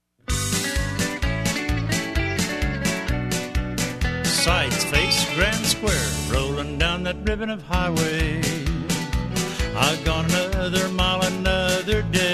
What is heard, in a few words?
vocal, square dance music